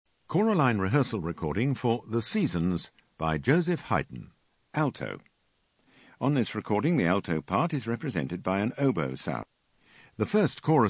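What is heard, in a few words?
Alt, alto; Haydn Seasons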